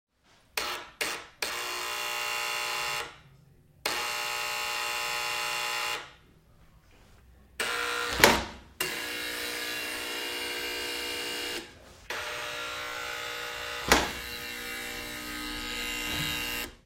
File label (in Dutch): deurbel